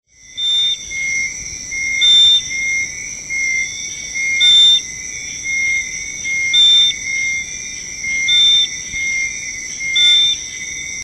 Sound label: Cophyla occultans